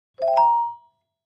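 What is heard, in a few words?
Clavichord Short Arpeggio; keyboards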